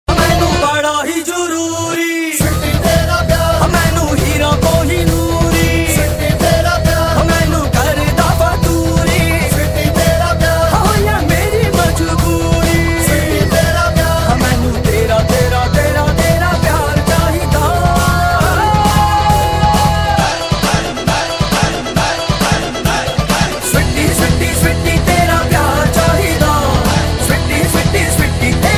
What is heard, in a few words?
Punk, Full Tone; Bollywood; Delhi Belly